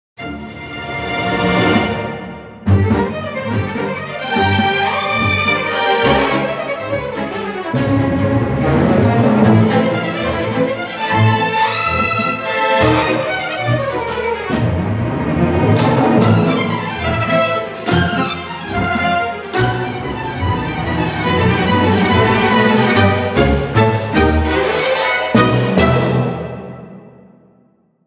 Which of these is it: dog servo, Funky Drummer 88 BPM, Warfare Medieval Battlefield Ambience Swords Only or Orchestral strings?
Orchestral strings